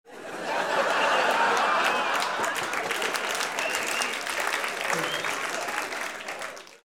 laugh long

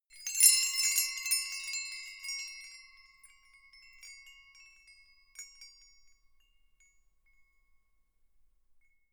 windbells ringing

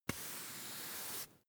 broom hand sweep slow

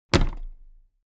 toolbox place upright heavy

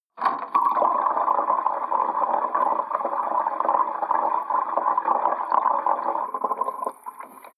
sink underwater fill

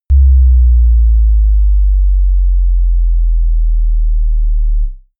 bass drop soft

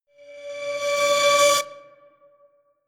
promo pad

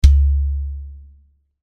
drum hit

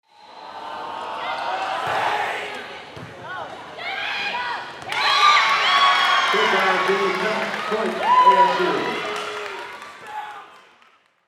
volleyball match

